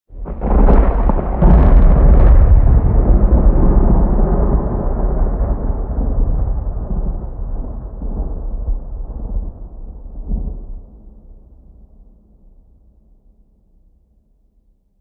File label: Sphere Thunder